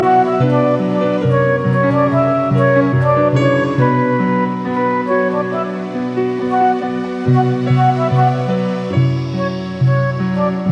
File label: Instrumental One Drum